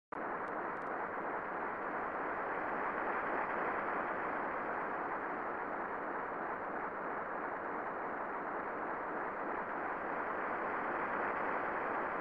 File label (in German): regen; harvest moon